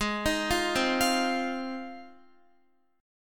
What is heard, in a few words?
G sharp 6th G sharp down Guitar, chord; G#6; arpeggiated